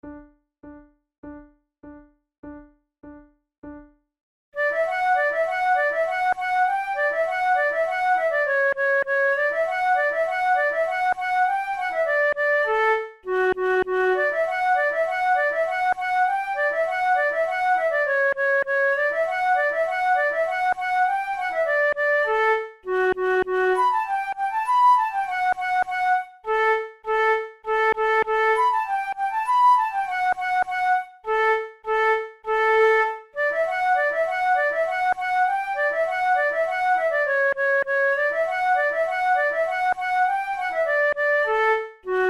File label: quick step duet, flute